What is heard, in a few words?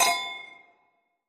Mug Clink